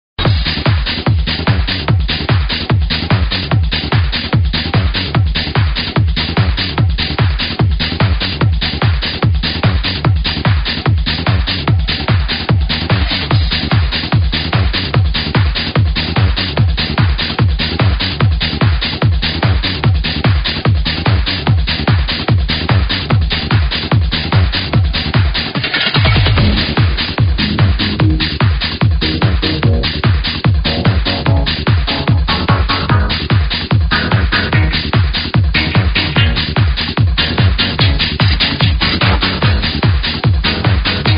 kick; multitrax

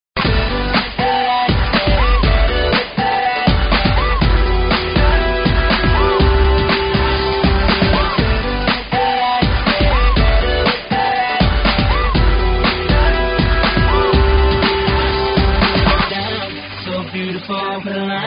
funk; multitrax

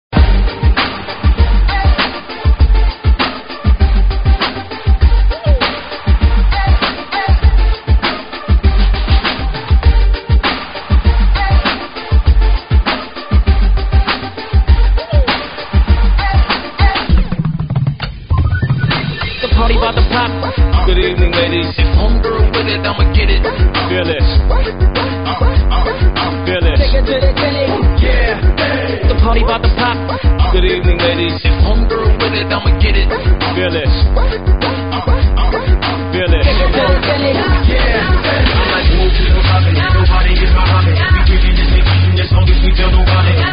funk hiphop

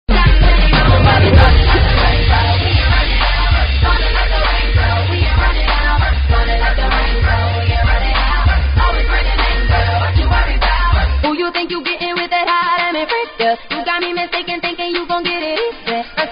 funk hiphop

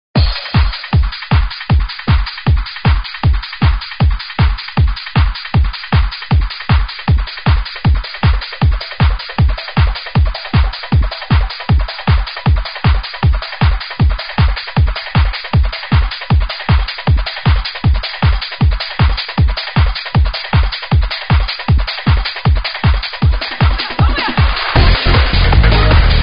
kick; multitrax

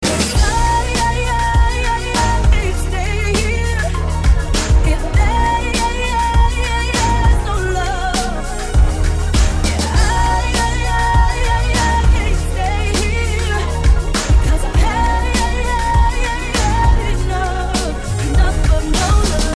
funk; multitrax

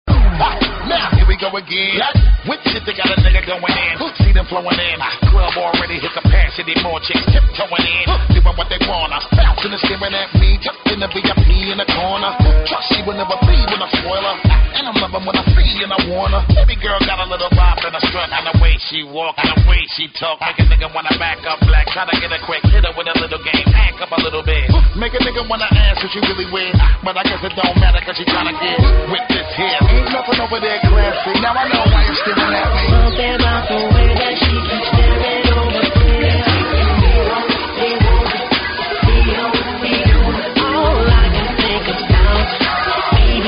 funk; multitrax

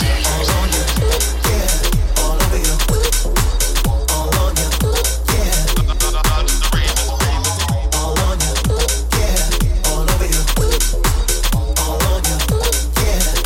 funk; multitrax

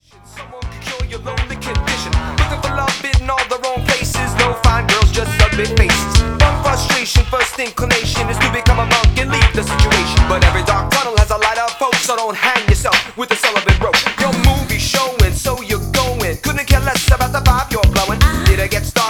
funk; powermusic

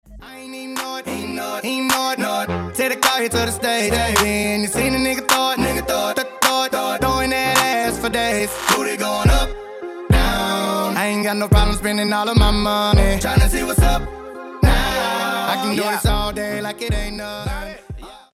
funk; multitrax

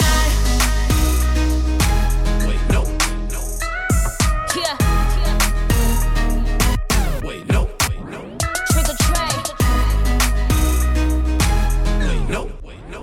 funk; multitrax

